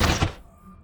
factory boss land

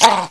Skeleton Attack

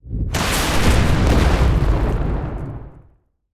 Burning Field; starbites; Zerom

